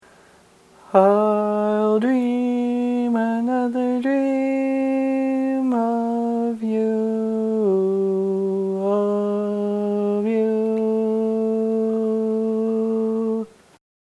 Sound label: Bari, Barbershop Tags, Baritones + Tenor Version